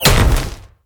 fire sword

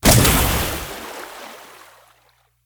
big water cannon

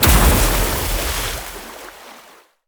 big water cannon

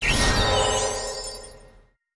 skill spark light boost

